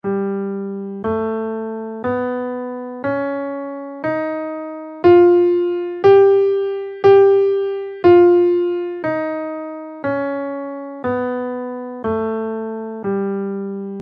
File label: whole tone scale in G, guitar